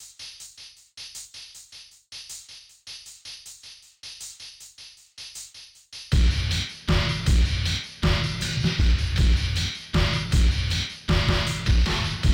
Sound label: chunka bunka beat; weeklybeats 2026